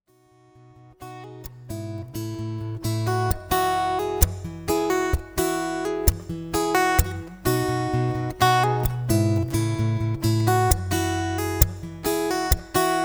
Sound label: Unplugged Sample Piece Acoustic